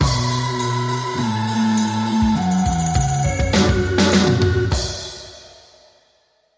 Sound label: Clean Sting